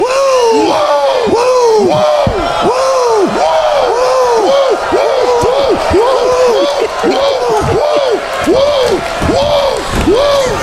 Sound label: WOO